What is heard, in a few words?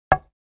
2x4 Impact, hits